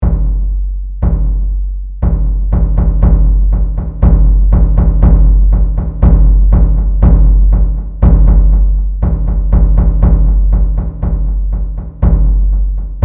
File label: drum